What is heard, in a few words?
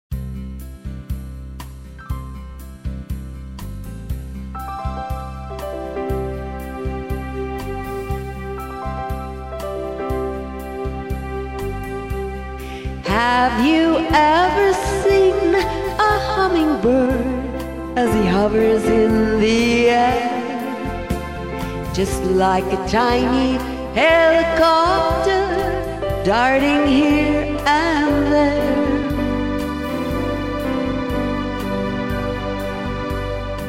Humming Birds